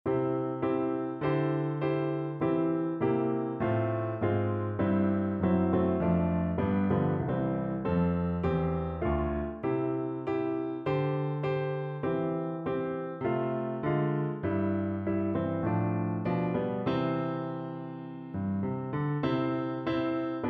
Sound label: piano; Daj Boh stastia tejto zemi